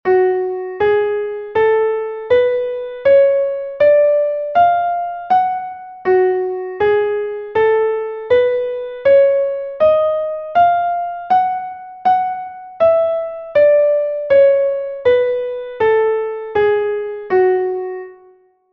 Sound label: scale of f sharp harmonic